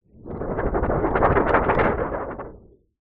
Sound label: foley paper laminated large sheet shake wobble